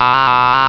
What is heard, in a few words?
aaAAaaaaAA